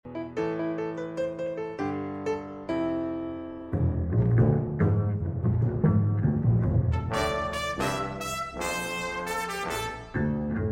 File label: Concerto for 2 Pianos